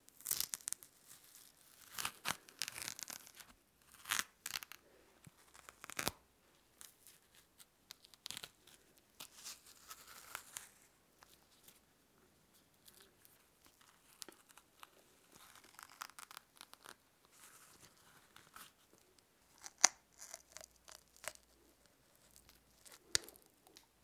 Banana Rip

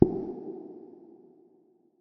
Stab Reverb Hit Minimal

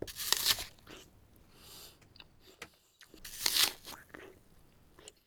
Biting Into An Apple

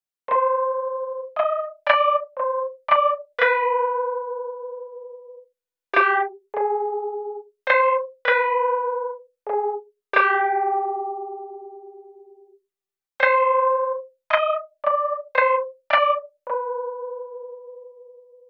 Spooky Piano Tune

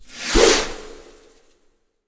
Whoosh

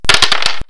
Dice land

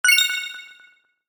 Ui Confirmation Alert

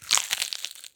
Lettuce Crunch

